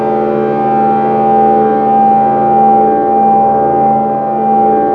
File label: BELLS, WEIRD ATMOS; Zero-G Ambient 1; WEIRDATMOS#1